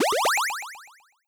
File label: power up item slow pitch bend up